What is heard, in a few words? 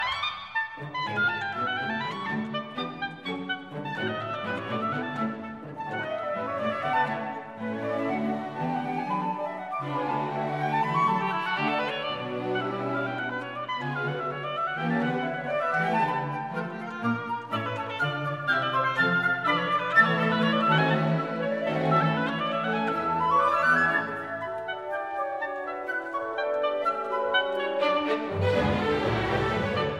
Oboe Concerto, Vivace; Strauss, Richard